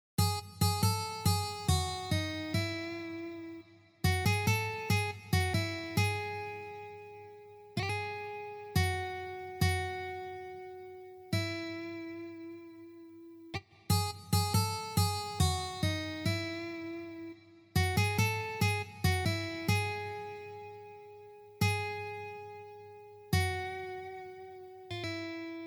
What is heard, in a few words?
Solo